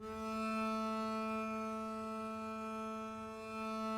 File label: a3 down, arco looped; meatbass